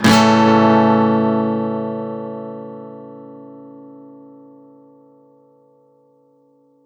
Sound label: Guitar 128 BPM Amaj; One-Shots; Ghosthack Advent Calendar 2021 - Day 10; Guitar Loops and One-Shots